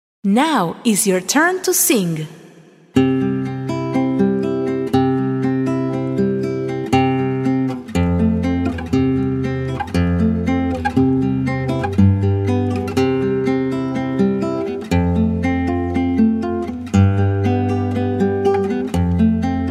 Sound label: Twinkle twinkle KARAOKE